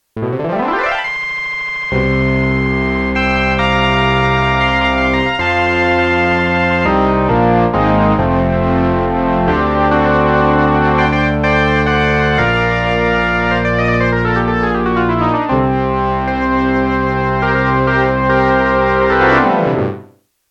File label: Organ B3; micromonsta2